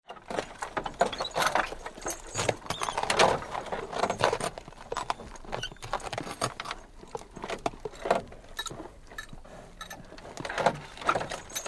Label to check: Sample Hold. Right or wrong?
Wrong